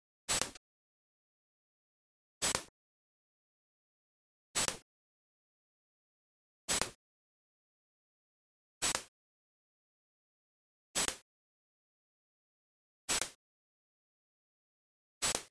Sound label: Next Stage; Dynamite Headdy